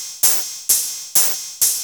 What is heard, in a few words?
Hi-Hat, EDM; Sonic Foundry (Sony Creative Software); Afterhours EDM; Ibiza Underground